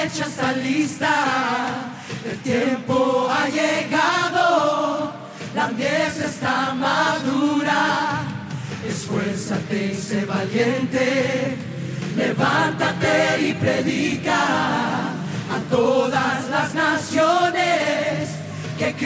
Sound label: BGVS; multitracks; 149 bpm